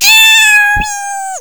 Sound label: pain; faerie